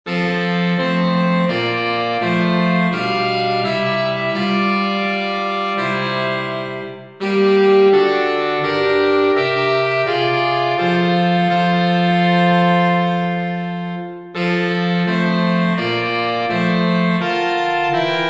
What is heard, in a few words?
saxophone quartet